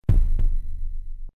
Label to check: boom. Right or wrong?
Right